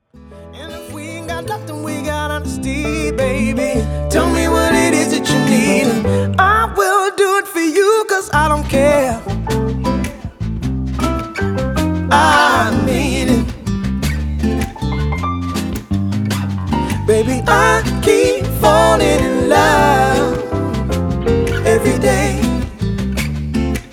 R B Soul

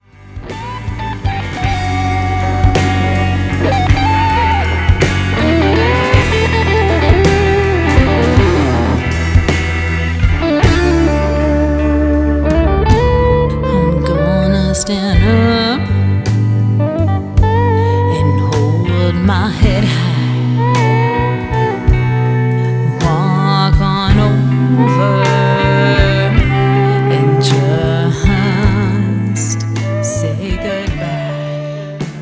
Blues Rock

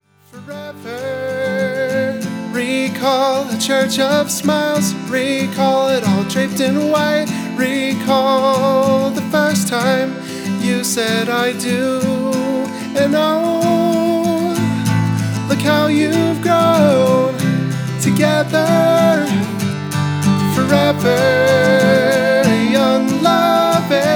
Acoustic Folk

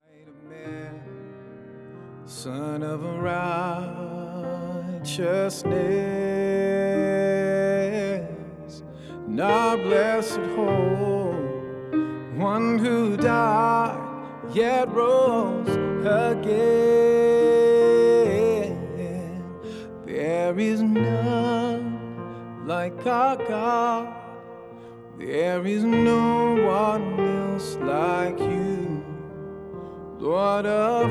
Piano Spiritual

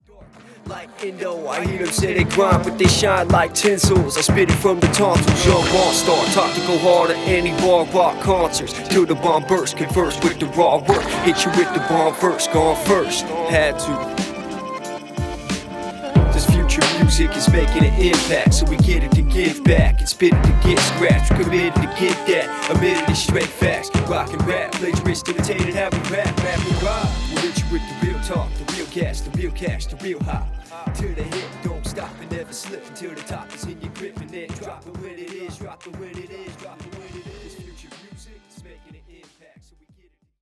Raw Rap

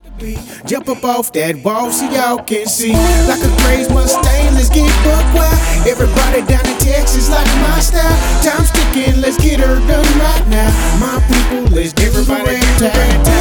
Country Dance Jam

